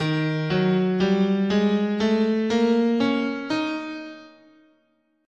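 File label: D sharp Mixo Blues, guitar, scale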